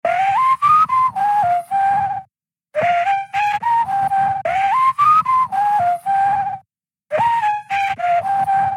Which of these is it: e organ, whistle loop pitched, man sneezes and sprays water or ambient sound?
whistle loop pitched